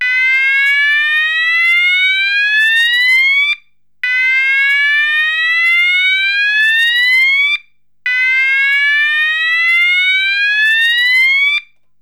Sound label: Sounder